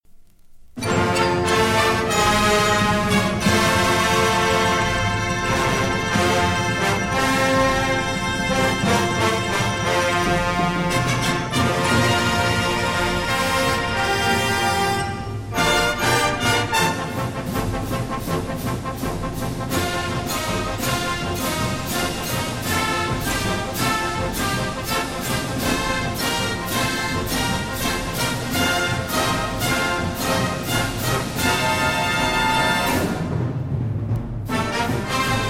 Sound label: Fanfare; WestSide